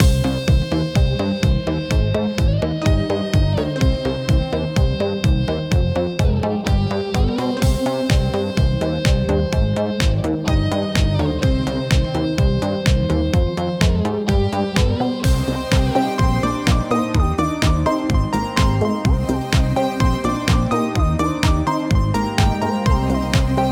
80s Ancient Melodies; 80s Music Pack Vol. 2

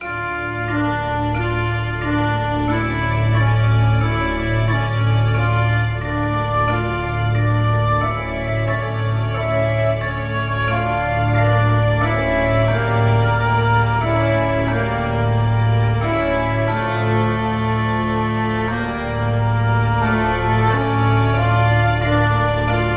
Magical Clocktower; Magical Music Pack

